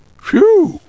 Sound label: Phew; PUZMANIA